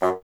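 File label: wind bassoon F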